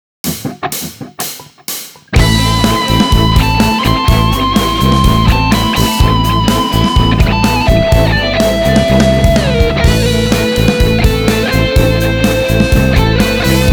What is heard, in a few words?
practice melodia